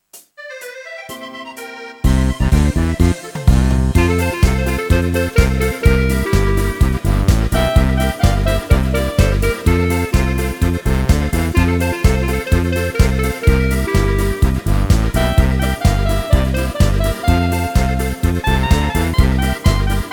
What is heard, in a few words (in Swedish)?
Tidig Polka